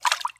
atk water bubble

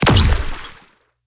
umbrella

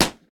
small salute cannon

